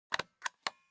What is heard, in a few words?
object office stapler staple slow; smartsoundfx